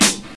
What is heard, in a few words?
clear snare drum sample g key, snare one shot tuned to the G note